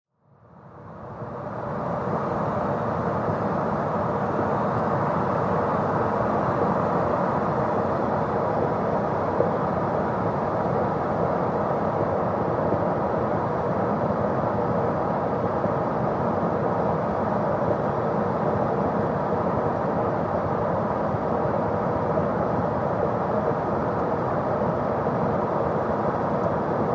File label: SUV At 55 65 Mph Stop